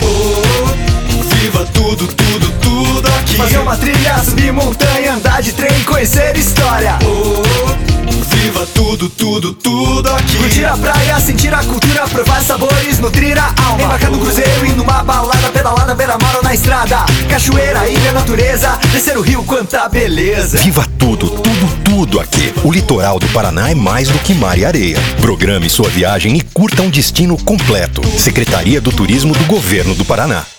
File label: jingle